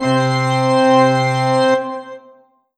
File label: Alesis Sanctuary QCard St Pauls C3